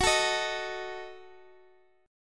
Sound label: F sharp Minor 6th, Guitar, Drop D; chordshape; down